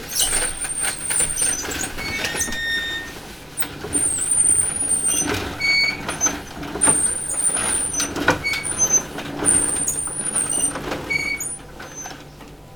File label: Mechanic Squeak